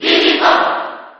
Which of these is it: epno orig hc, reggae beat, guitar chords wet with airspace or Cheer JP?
Cheer JP